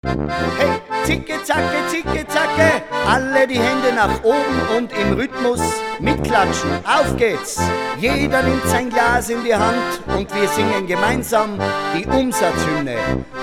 Alpine Volksmusik VoiceFX

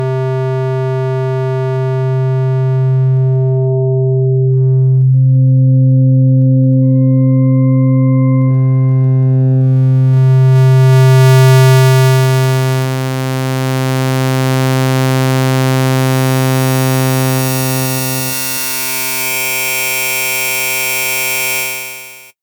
OSC Wellenformen Durchlauf

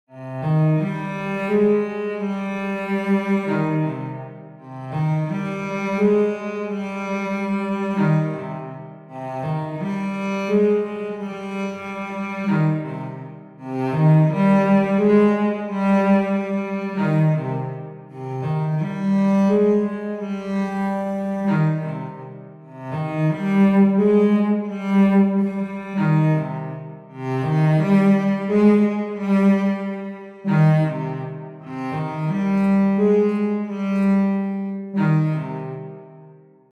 Emotional Cello Lange Artik

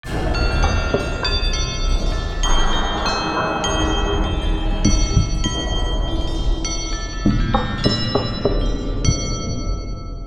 Klanghaus KH2 Layer KH1 und 2

